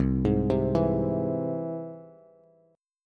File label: C Augmented, Bass, chord; arpeggiated; 8,11,10,9; JGuitar